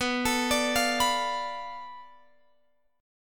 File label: B-Minor 7th Flat 5th B down Guitar Open F; Bm7b5 arpeggiated